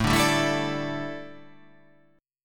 A 7th Suspended 4th, chord

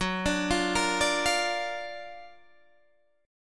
Gb Augmented Major 7th Gb down Guitar DADGAD

